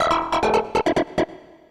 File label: Industrial 140